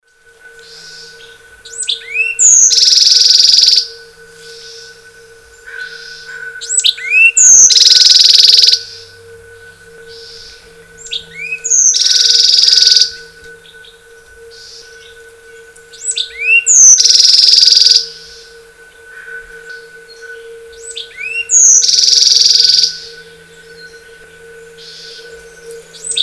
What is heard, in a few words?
bewicks wren